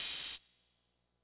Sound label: Hihat Open